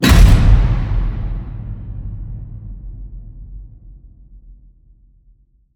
HIT Metal Crash, Cinematic Hits Designed

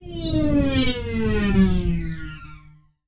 Space Ship Fly By; 1001 Sound Effects

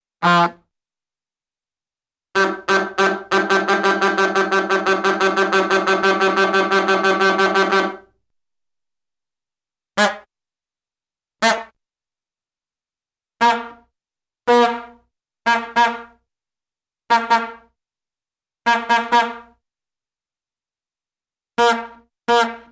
Bulb Horn Honks, Cartoon; Hollywood Edge; Cartoon Trax

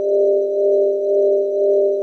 Sci-fi Hover Bot Loop; SFX Kit; Sci-Fi Loops

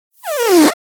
Fart Foley Strain, Thin And Windy; Hollywood Edge; sound designer's toolkit v2

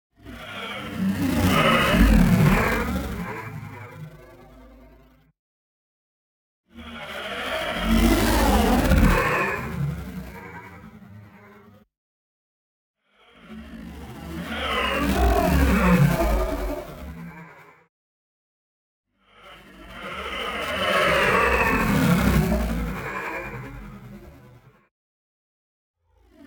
WHOOSH LARGE SLOW Motion Light Sword; boom library; Neon

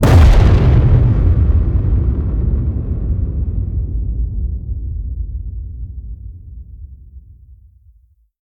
HIT Distorted, Cinematic Hits Designed

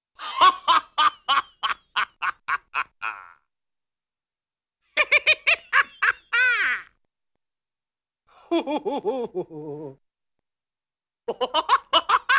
Villainous & Funny Laugh, Cartoon; Hollywood Edge; Cartoon Trax

